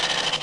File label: aliens